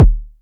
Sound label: kick, Korg ER-1; Kit 13